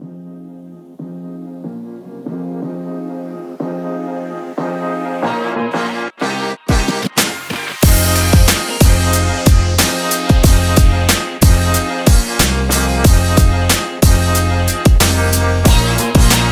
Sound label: Hip-Hop Energetic